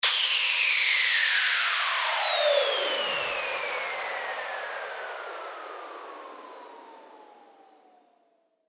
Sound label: WHOOSH